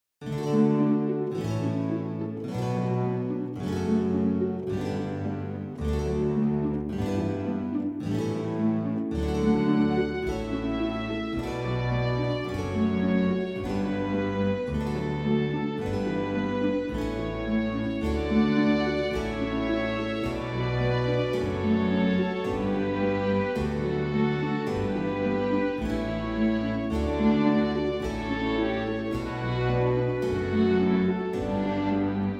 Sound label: Canon and Gigue in D Major I. Canon